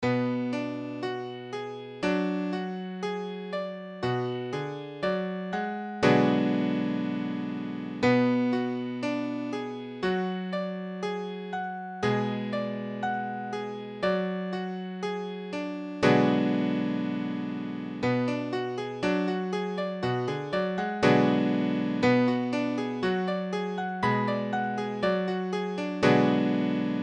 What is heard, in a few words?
Cbm7 5b, piano